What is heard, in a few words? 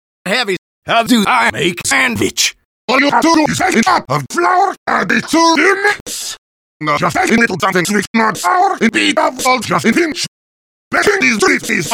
Acapella